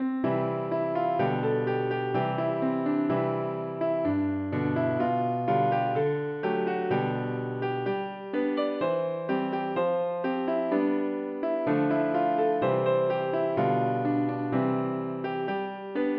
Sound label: piano